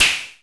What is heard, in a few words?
noise fx; Masterbits; Switch FX